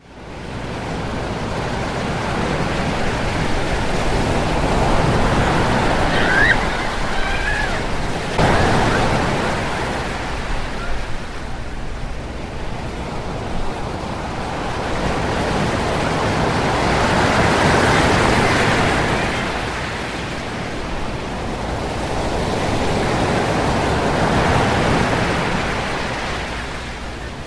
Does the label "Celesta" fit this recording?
No